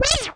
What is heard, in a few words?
enemy hurt